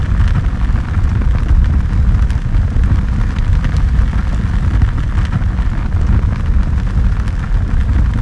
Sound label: flame, lava; zaero; world